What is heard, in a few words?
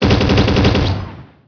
gun; Emperor - Battle for Dune